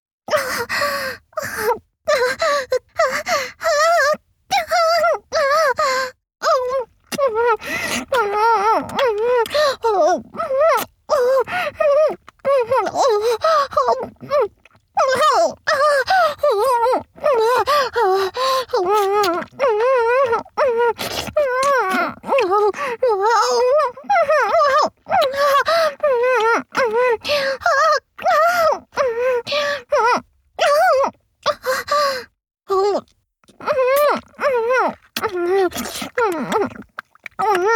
チュパ音, ASMR; フェラチオ(強)：自分の性器をいじりながら頭を前後に振って陰茎しゃぶる

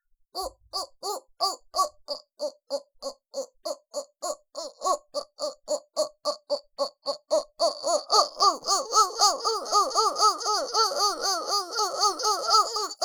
おっ！ おっ！ おっ！ おっ！, バイノーラル

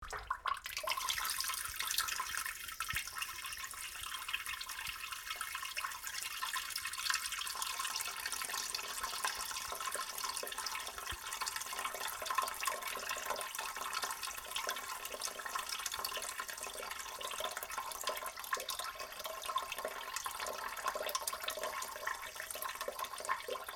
ちょぼちょぼちょぼぼおしっこ

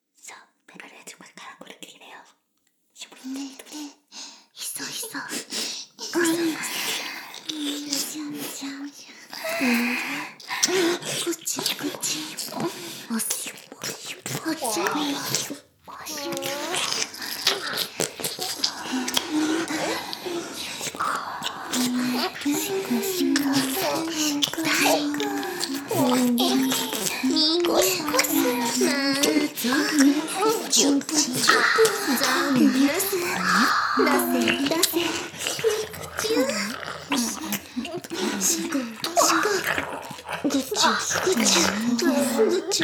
しこしこ＋フェラ, ASMR; 淫妖精の森

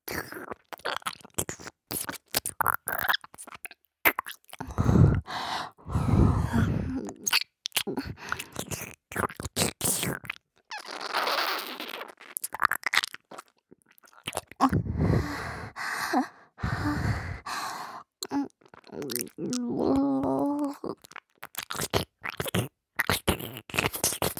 じゅずずずずぅ～っ, 右耳

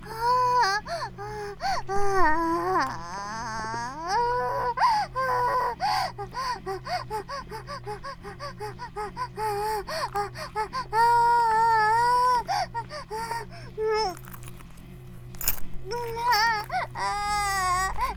バイブ放置, ASMR

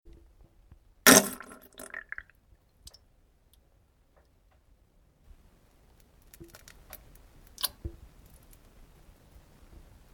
なんべんおなら ぶりゅむちゃちゃ音

